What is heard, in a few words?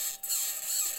mic, mars2020 edlcam